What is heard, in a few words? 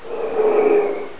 fan